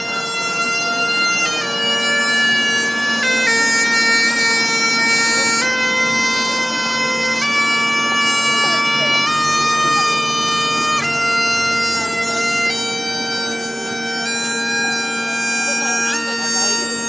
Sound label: norwich piper